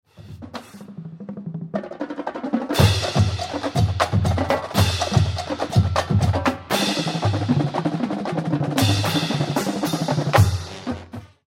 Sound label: Drum Feature, marching band